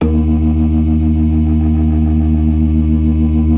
DRAWBAR VIB, Synth and Organ; InVision Interactive; Keith Emerson; 4DRAWBAR+VIB

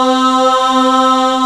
AAH C4

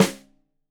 Rim Shot Stereo, drums; Deeply Sampled Drums

